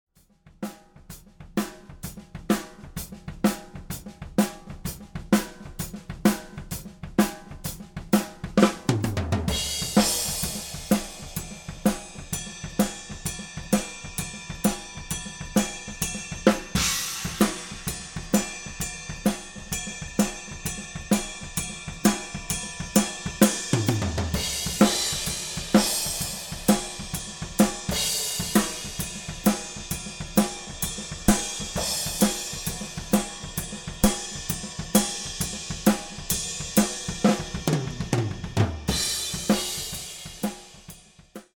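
Vgl Overheads e664 flat, Overheads solo - e664 in AB - flat; Sennh. e664 in AB, flat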